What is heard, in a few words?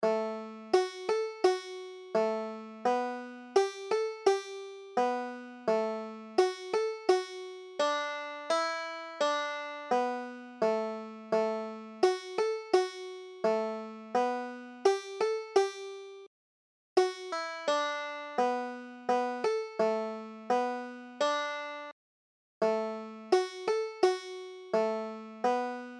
rocky mountain goat, banjo